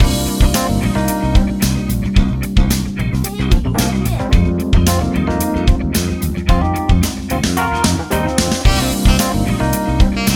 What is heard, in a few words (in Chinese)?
战斗